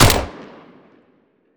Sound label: mac10, weapons; Counter Strike Model Pack; CS2